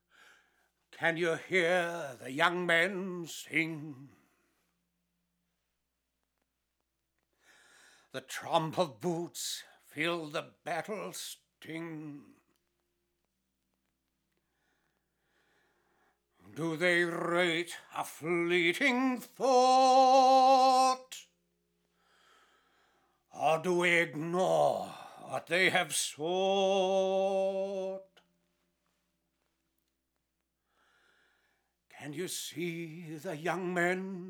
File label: So Many Vocals